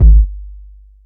deep kick one shot g key, Royality free kick single shot tuned to the G note